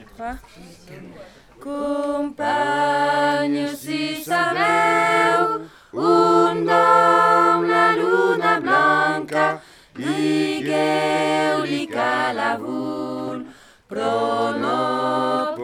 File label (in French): tutti